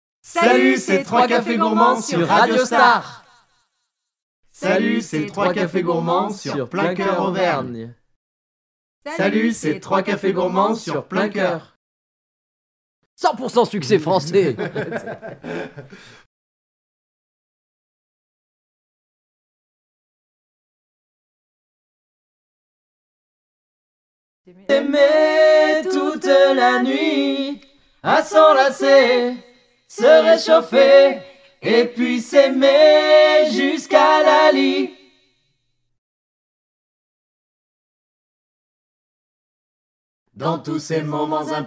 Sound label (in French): JINGLES; PLEIN COEUR; MOULINS